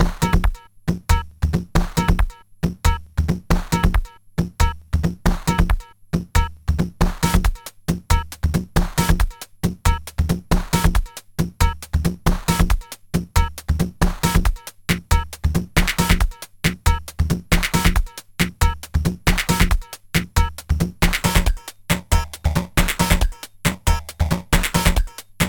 Beat using individual pitched and bent sounds 1, Tr 505 bend